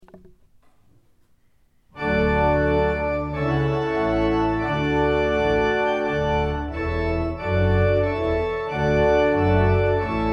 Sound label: Trumpet Voluntary; Clark; weddings music